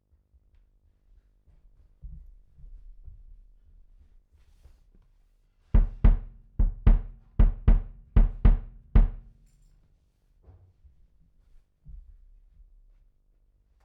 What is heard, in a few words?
Chordz